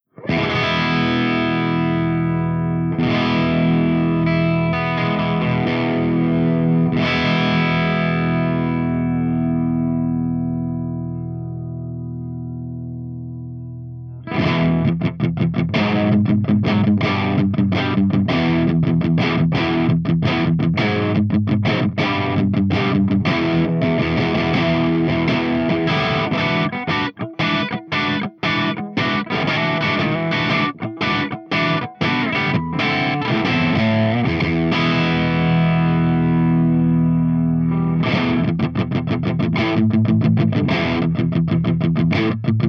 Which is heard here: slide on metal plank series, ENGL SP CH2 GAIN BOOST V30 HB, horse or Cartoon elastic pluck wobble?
ENGL SP CH2 GAIN BOOST V30 HB